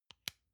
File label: lotion lid close soft; friseur; berufe